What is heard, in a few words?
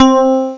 piano elec; Amiga Special - Software Hits; orchestra; keystring